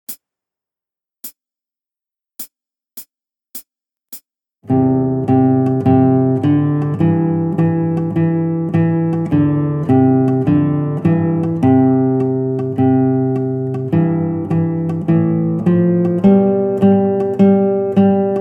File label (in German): Auf der Mauer, guitar; Play Guitar Together 1; Trad. Deutschland